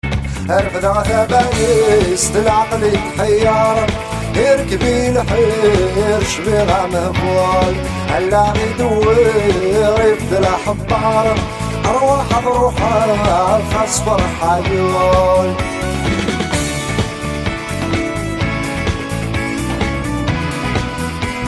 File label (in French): rock kabyle ringtone